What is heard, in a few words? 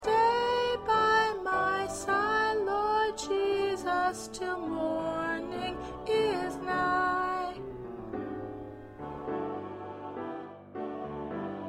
Lead Alto